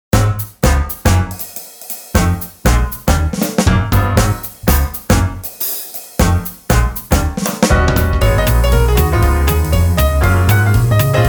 Jazz Piano Trio High Energy 119

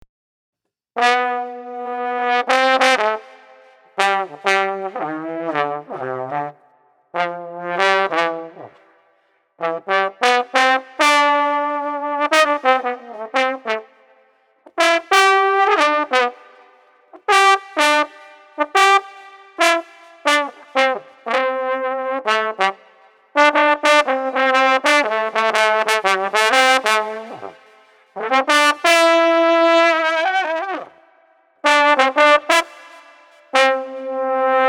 Street Trombone Sway 120

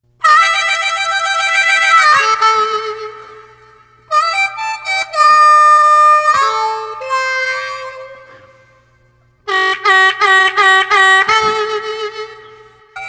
Street Harp Blues River 94

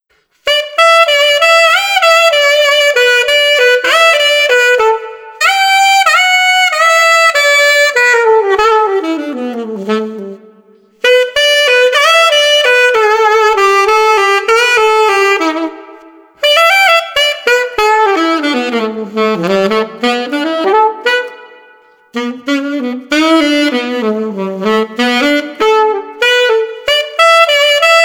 Street Sax Melodic Phrases 96

